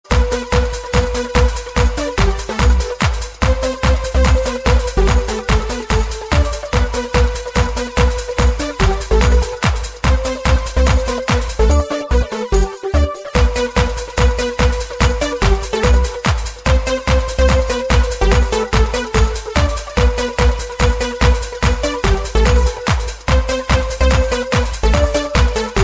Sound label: New Christmas Sound